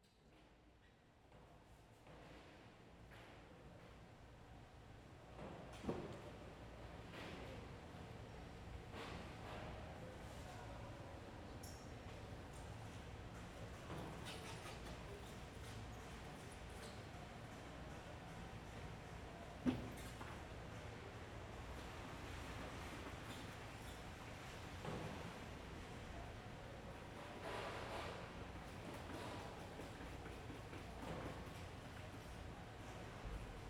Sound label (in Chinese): 「開店前的唐戶市場」（唐戶市場環境聲＆刷地拖刷洗ASMR）; 白銀; 蓄音レヱル しろがね